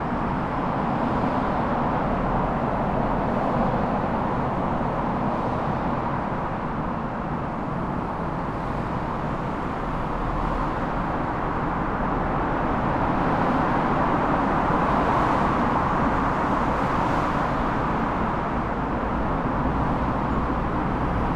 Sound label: CAR WIDE